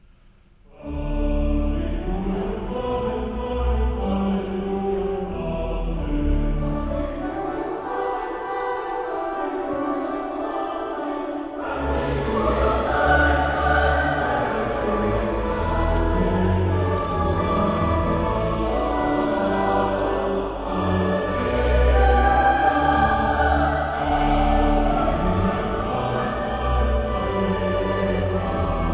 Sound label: Hallelujah, Amen, Choeur; Choeur Louisbourg